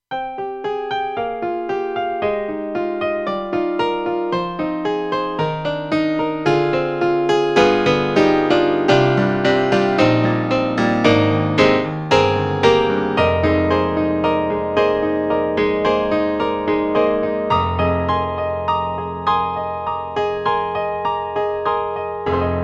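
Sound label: bright piano, KORG AG-10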